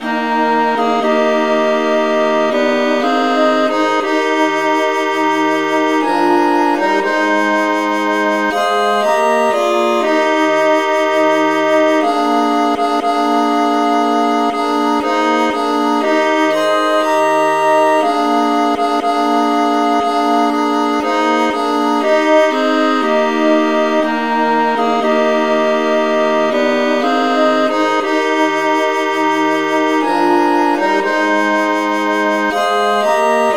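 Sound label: traditional key A flat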